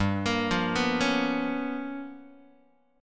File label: G 7th Sharp 9th Flat 5th G chord, Guitar; G7#9b5 arpeggiated; JGuitar